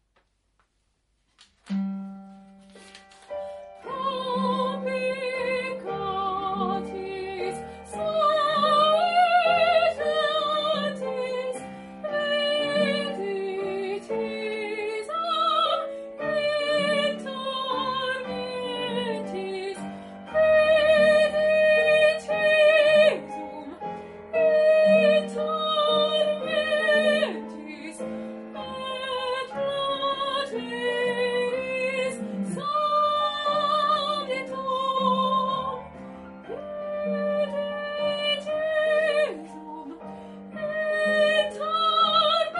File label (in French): ténor; stabat mater; Grandval n4